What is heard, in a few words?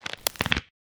Break Bone Med; Osteotic Bypass